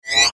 Rollover Electronic Digital Ascend; Mouseovers; Multimedia; Blastwave

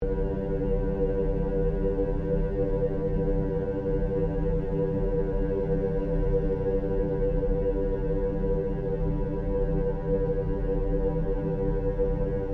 Created Drone, Drone Sound Effects

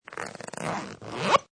Zipper Zip Up; Zippers

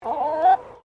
Bird Chicken Berel Clucking; Birds from Collingwood Children's farm

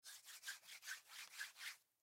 Human Hands Rub, Hands Aftershave Rub Hands; Primping; Blastwave; Humans

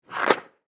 Hockey Puck, Shot; Sports; Hockey (Sound Effects:Sports)

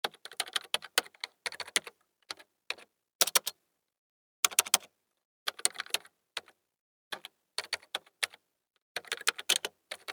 Computer Keyboard Typing; Technology; Offices

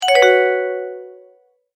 Multimedia Tone-Return/Back; Tones; Sound Design; McKinney Sound

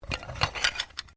Bones Human Move

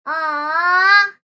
Kids Aww, Male, child, whine

